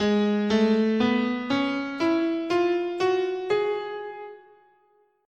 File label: Ab Symmetrical